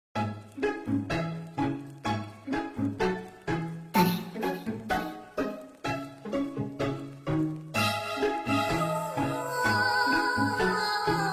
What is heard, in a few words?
Dance Dance; mixkit